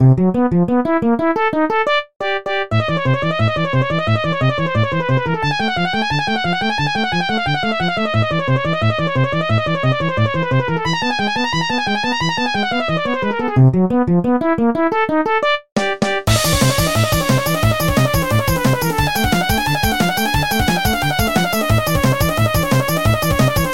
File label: Boss Battle; Level 100